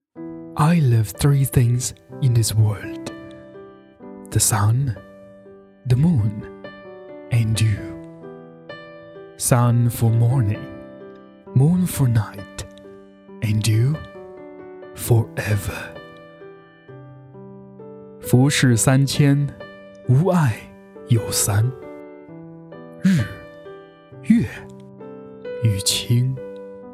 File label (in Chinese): 中英歌混